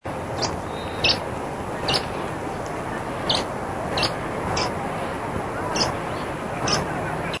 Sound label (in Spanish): voz pijuí frente gris